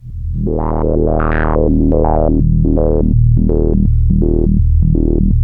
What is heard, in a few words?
ARP 2600, Vintage Keyboards